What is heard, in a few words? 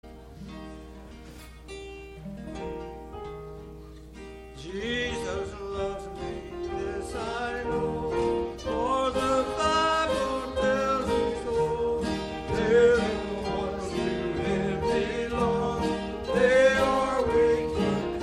Choir; Jesus Love Me